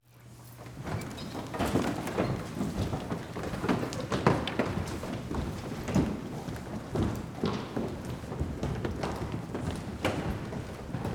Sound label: Walking on wooden floor